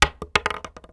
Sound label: wood; Mafie 1